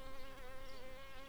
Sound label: Recording, bumblebees, Sylvarum workers